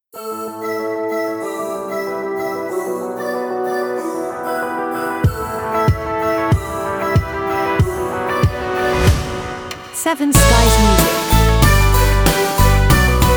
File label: Corporate Wonderland